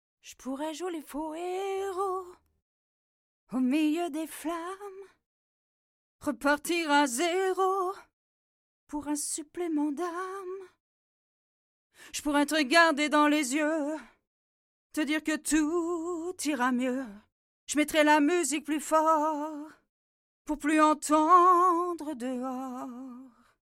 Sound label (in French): vocal femme, mono